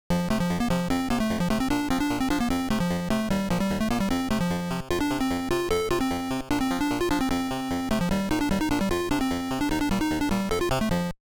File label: Level End; Fatal Run; Atari 7800